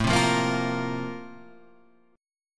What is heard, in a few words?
Bb Major 7th Suspended 2nd